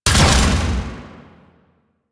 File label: Layer Shot A, Shotgun; Phantasma Prime; Revenant